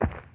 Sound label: Footstep